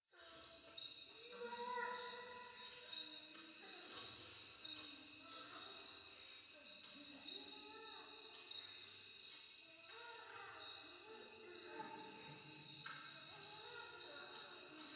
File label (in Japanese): トイレ イラマチオ 山奥夜